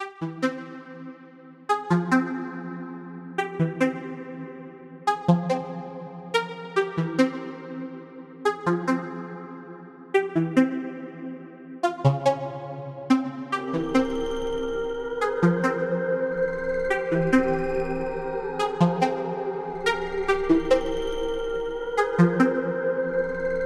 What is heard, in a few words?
Synth Plucks 142 BPM C